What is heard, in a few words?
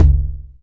909 LO TOM, TR909